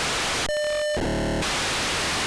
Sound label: poc no filter